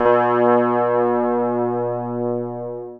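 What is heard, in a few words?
bass C4; Greytsounds; Scott Peers Sound Engineering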